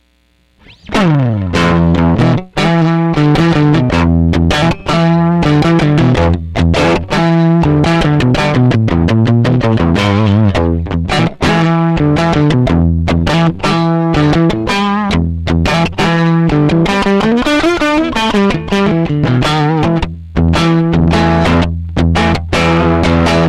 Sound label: Blue Riff, Distortion Experiment